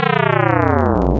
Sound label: analog